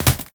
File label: Hit; moul